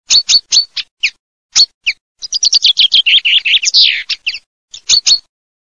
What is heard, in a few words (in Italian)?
FRINGUELLO